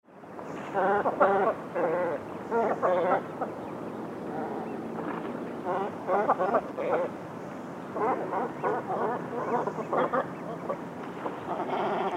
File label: Phalacrocorax carbo call